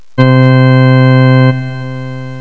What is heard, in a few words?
ORGAN NOTE